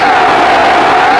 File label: All Projectile